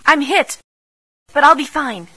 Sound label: hit bad; sven; npcs